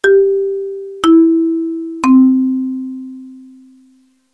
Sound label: marimba